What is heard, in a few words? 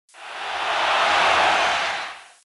crowd